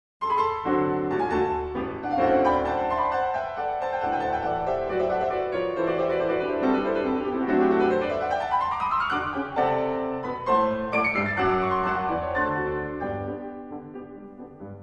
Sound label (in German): konzertanter tango 2 klaviere